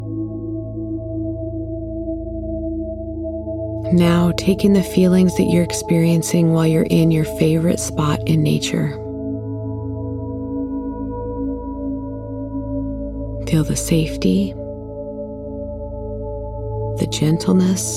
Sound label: Baby In Nature